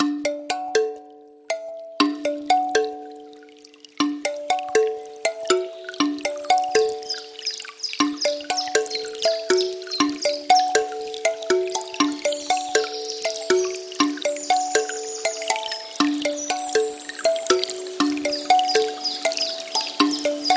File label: brook, alarm sesleri